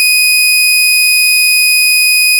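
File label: string D#3; fleet keys Samples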